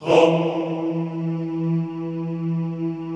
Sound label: HOMMM E2 L, Extended Classical Choir; Best Service